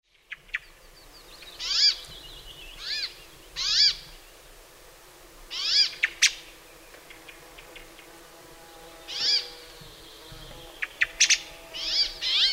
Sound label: brambling